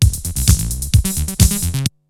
LOOPS, TECHNO; Syntec; Wall of Sounds; TECHNO-BOX

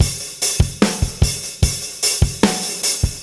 Beat Loops; Zero-G; Monster Beats

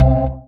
DM ORG1, Dance Mega Synth

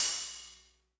Cymbal, mfb 503; classic machines

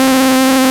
Short Circuit C5; Digital Sound Factory Xtreme Lead

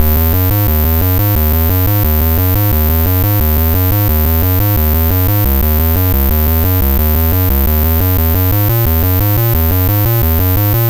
Apple II Bass, Chiptune; Sonic Foundry; Sony Creative Software

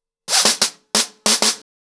90 BPM, acoustic drums, drumloops

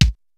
XR-10 K+SN+H, drums

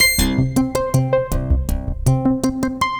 Acid Bass

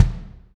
KICK, Ensoniq ZR-76; 300 Drum Machines

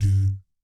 DOOT G2, Jazz; Spectrasonics; Vocal Planet; Jazz FX; JAZZ COMBO

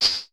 HH, Vermona Mono15 Drums

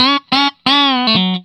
RIFF 120, Vintage Blues Guitar

